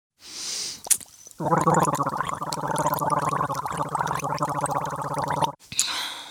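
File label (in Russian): полощет рот звук воды во рту горле; poloskaet rot zvuk vody vo rtu gorle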